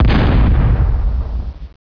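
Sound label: EXPLOSION MEDIUM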